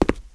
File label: boot, footsteps